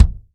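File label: Acoustic Kick; Roland SPD-S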